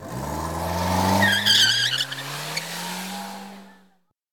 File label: Vehicle Small Car Burnout